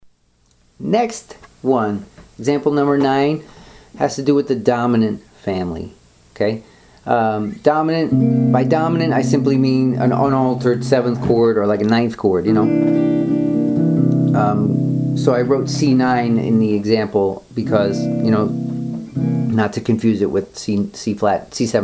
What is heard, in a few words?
advanced minor pentatonics